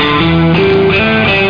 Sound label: FUNKY; SONIC